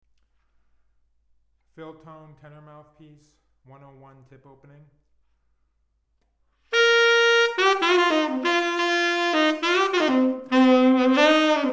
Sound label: Funk; Phil-Tone